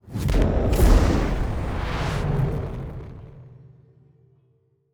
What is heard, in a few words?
ship combustion move; ships; pre-FTL; Stellaris; first contact